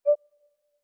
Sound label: Beep, ringtones; CiscoSparkLauncher